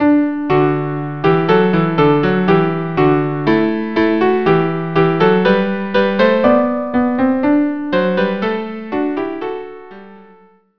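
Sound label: viola cello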